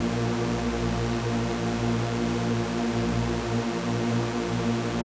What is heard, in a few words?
SuperSaw A#3; KG MegaSaur; M8